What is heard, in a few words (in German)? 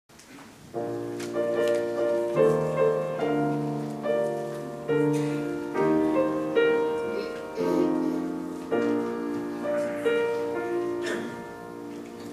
Weihnachtsglocken